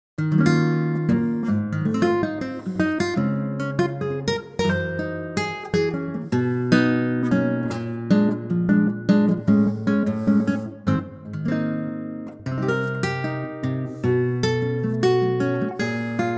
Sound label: Ab Ac Gtr; key - Ab - vocal range - Gb to Db; Gorgeous acoustic guitar arrangement